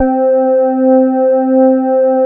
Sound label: Korg POLY800 C3; Back In Time Records; Korg Universe VOL-1